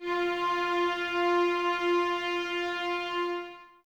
VNS F 4, Violins, String Ensembles; Miroslav Vitous

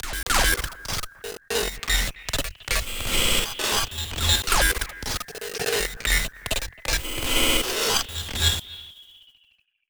Glitch

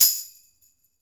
tamb; AKAI S-Series CD-ROM Sound Library VOL-5

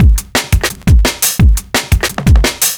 Drum Loops, 172; KrustBoyz; M8; Electronisounds

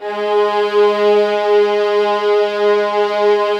G#3, soft violins; Q Up Arts; Denny Jaeger Master Violin Library

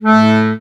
ACCORD A1, Accordions; USB Soundscan vol.40 - Complete Accordions; AKAI

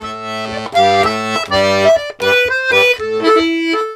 Accordions, 120 laride; USB Soundscan vol.40; AKAI

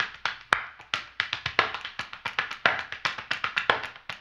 HAMBONE, Vocal Blues Soul; Spectrasonics

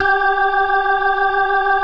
G4, PERCORG; Sonic Reality; Vintage Timetraveler

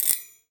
Grill Pan, household rhythm samples Hits

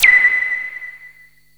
SYN. WHISTLE; Best Service Alpha Dance vol.2

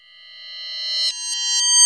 REV, SOUND EFFECT REVERSE

